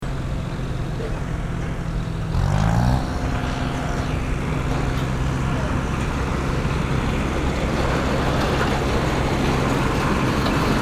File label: Cane Train, fiji